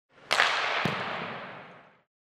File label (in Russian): vratar lovit shajbu